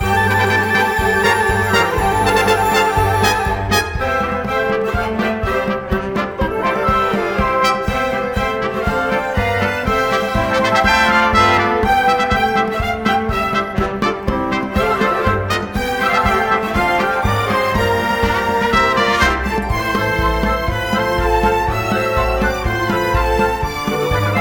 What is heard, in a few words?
Ding Dong Audition Track Backing; oz audition materials